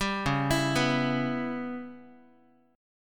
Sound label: C sharp Minor 7th Flat 5th C sharp Guitar; C#m7b5 arpeggiated; 10,9,7,9,x,x; JGuitar